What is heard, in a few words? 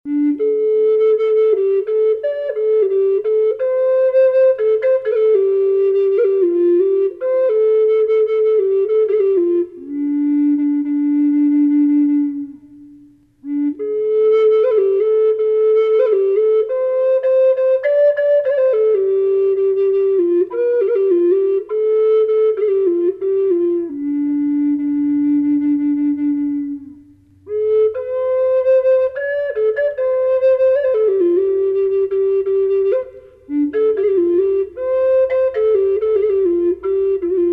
snowy owl yellow cedar low D reverb, flute; collector flutes